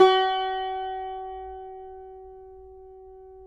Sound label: CUMBUS F#, Guitars; Spectrasonics; Hans Zimmer Guitars VOL-2